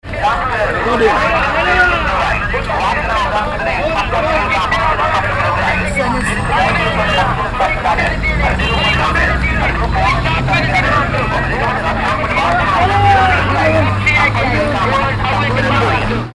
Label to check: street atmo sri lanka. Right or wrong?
Right